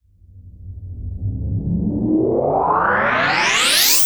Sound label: reverse, FX; Vengeance Samples